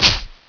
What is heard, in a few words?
mushroom hit